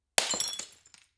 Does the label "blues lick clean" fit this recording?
No